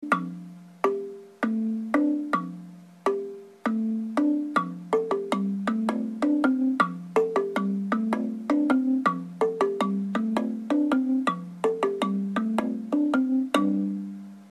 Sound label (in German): Schlitztrommel